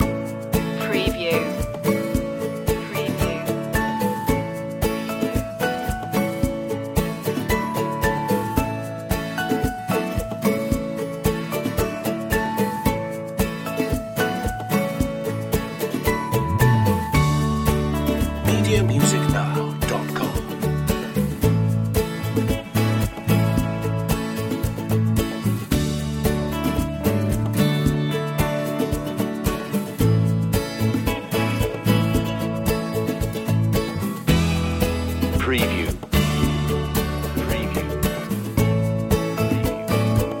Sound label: moving forward loop; acoustic; country-folk